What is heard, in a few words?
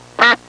Quack sound